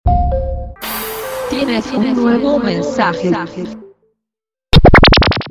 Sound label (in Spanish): Aviso Mensaje